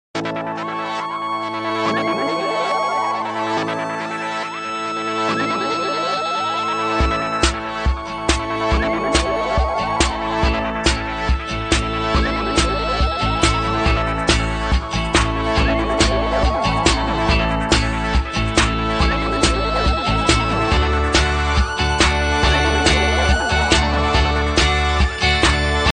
Best Nokia N8

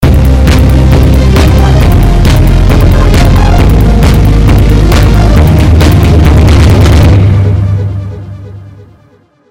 classical boogie

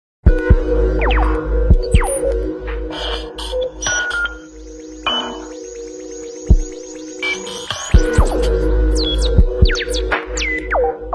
nokia c20

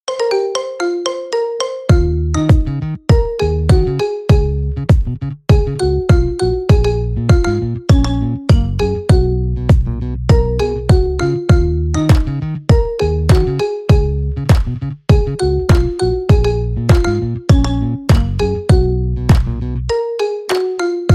Marimba Remix; suonerie

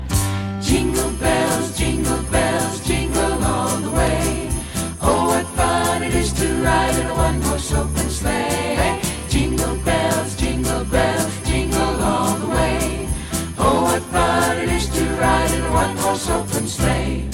jingle bells cool

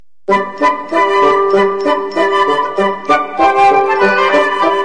message tones x christmas

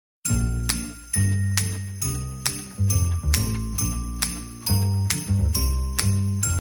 xmas sms

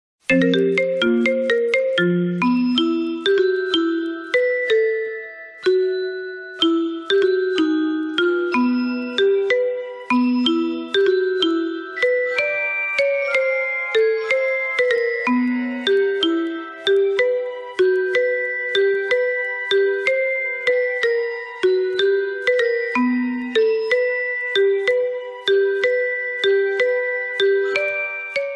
theme marimba; suonerie

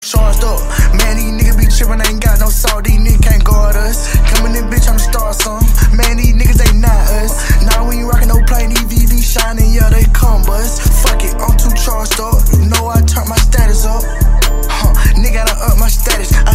charged up

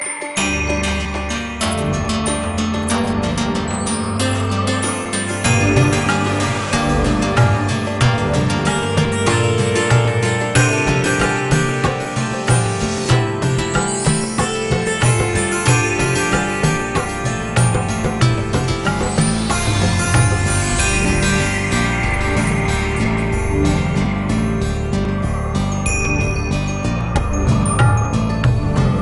classic crystal ring

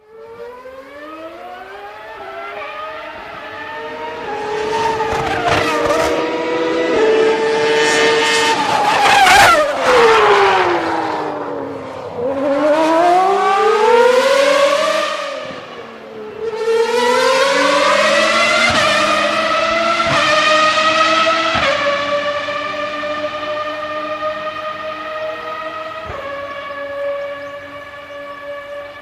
ferrari f1 sound

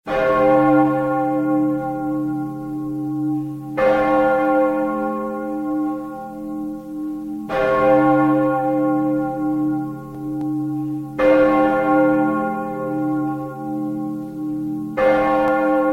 big ben alarmi

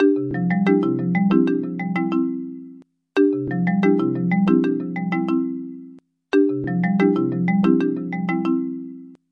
huawei marimba

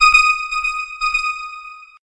horror whatsapp

